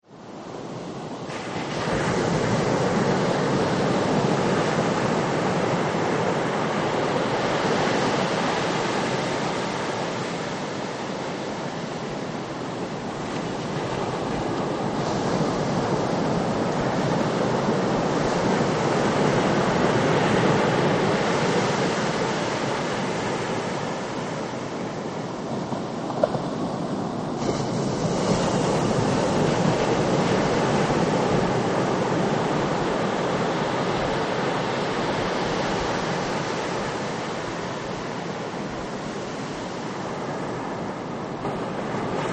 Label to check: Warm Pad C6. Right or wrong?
Wrong